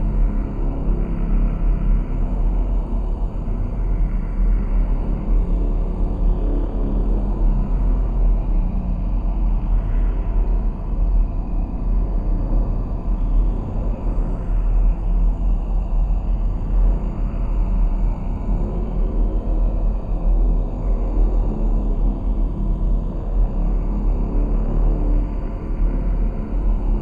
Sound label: ship titan idle; Stellaris; shroud; ships